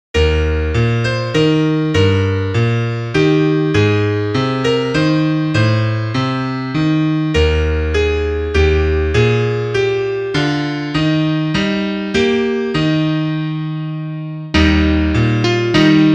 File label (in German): Klavier Solo, trompete; Chanson